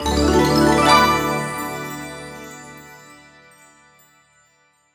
win Medium; imperialriches

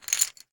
Win Sound; blackjack3

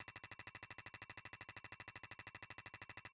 count Up Loop; koi princess

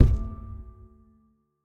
reel stop; fairyred

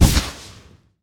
win Symbol Flash; fairyred

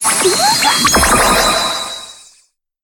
win Animation; koi princess

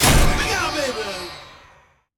scatter hit

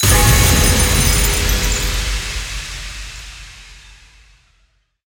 mega Win Text Change; secrets of christmas

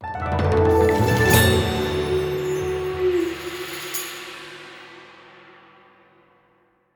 freespin Intro; imperialriches

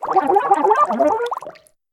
speech bubble talk; koi princess

